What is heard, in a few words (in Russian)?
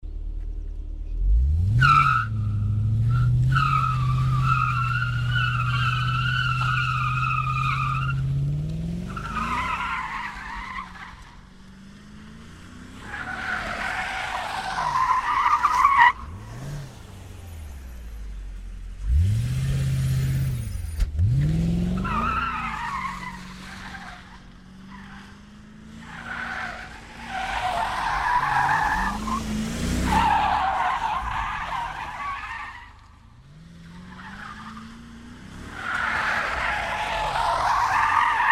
vigz shin otezd drift ostanovka